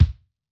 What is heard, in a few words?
Hiphop kick; Reverb+EQ+Comp+Limit